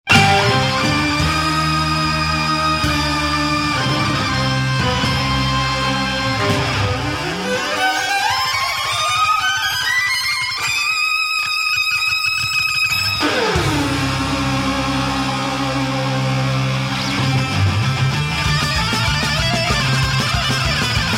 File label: gypsy violin waltz